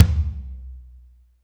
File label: Kick, Alesis DM5